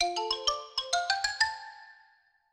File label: grover says licks; Sesame Street Ready, Set, Grover